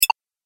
Collect Chiptune, video game

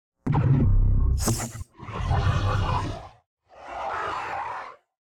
Distortion Left To Right; Distortion Feedback Left To Right

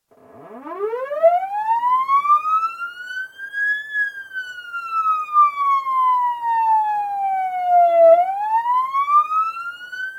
Police car wail siren, interior acoustic; Emergency Vehicles; BBC

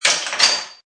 Metal Bench rattle; Metallic (Sound Effects:Multimedia)

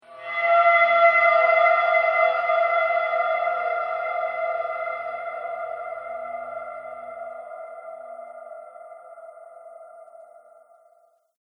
Slasher Horror Transition

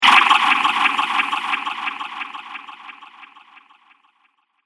Fantasy Spinning Attack; Magic Sound Effects

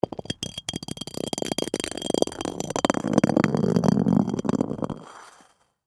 Ice Object Roll, Across, Ice; Texture; Rolling (Sound Effects:Objects)

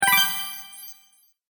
Bright Magic Bonus, video game

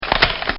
Rocket Launcher-Extension Of Gun

